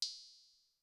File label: Cym; Sonic-Potions LXR